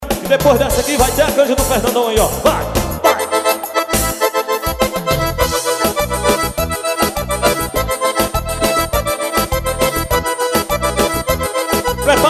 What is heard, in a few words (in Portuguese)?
solo